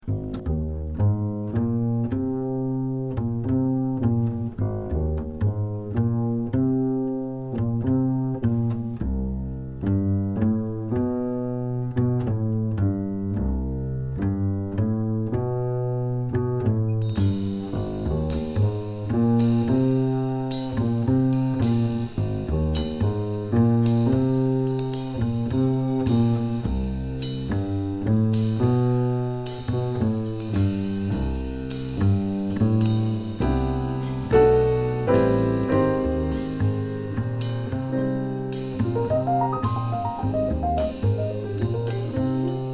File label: minus melody